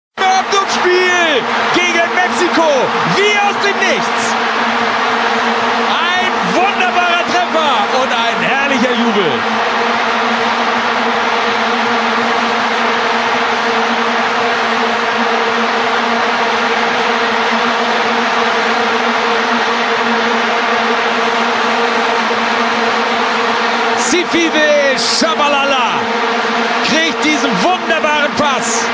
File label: vuvuzela sound and commenter